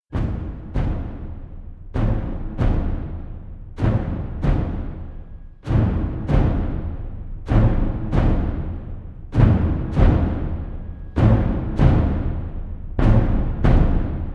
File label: War Drums